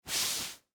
Dress Shirt Wipe, Hand; Foley, Clothes; Dressing; Human; BlastWave

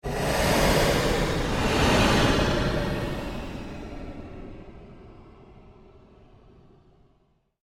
Created Surge Long Sweep, Sweeper Sound Effects; Sound effects created by manipulating other sounds

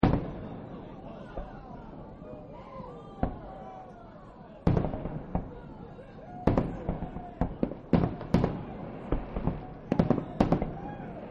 Fireworks Party; Party Sound Effects; Bars

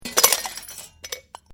GLASS BROKEN METAL TRAY

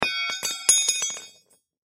Metal Rod Drop, Foley Metal Rod Drop Pipe; Metal Sound Effects; Materials; Blastwave

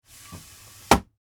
Ambulance Compartment Drawer Slide Open; Emergency; Emergency Vehicle; SFX Bible

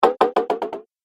Game Fx Descending Hits, Video Game Sound Effects; Twistedtracks

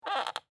Wood Creak Squeak, Old, Handle

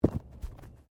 Body movement Tumble Light

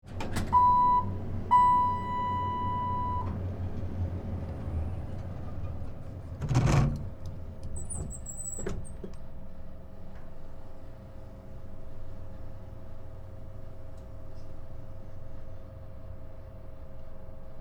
Elevator Ride, Office, Sequence; Elevators (Sound Effects:Machines); BlastWave

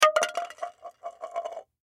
Cups Plastic Drop, Cups Plastic Drop Childrens; Bounce Rattle; Household; Blastwave

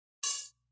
Metal Hit; Multimedia

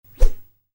Woosh, Whoosh: Short, Light; Whooshes; Sound Design

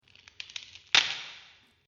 Bone Break, injury; combat

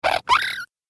Little Imp Chatter, monster sound effect; Little Imp Chatter 3; Horror; Andrew Fleming

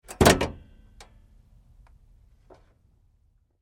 Truck GMC Savana Hood Open; Vehicles

